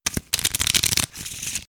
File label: wash Poker; chicken